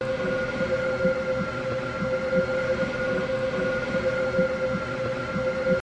high speed train

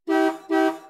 car horn; bel air

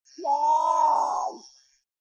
potoo maawwwm

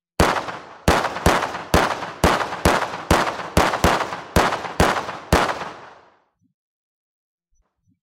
shooting a lot of ammo

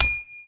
coin; limbus company

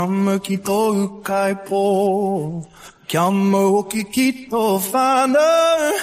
vox

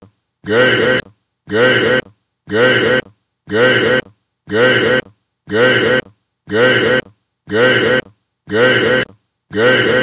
loop; gay

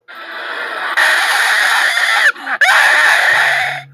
handy jumpscare

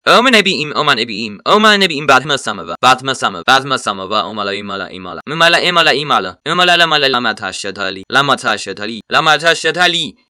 rapping in tibetan

